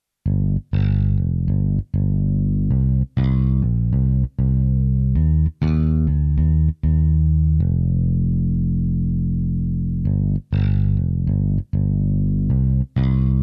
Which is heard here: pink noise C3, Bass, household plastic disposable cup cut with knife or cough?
Bass